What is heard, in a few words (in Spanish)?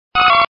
Grito de Furret